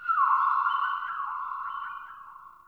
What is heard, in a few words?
Venus Landscape Distant Robot Call Low, Ambience; Warframe; Venus Open World